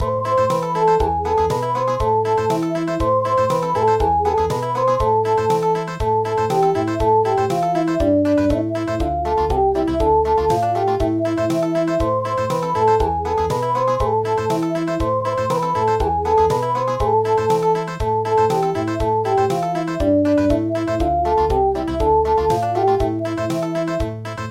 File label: Country; Russian Desert